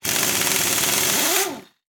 Industry Tool Run Half Inch Impact Wrench With Whine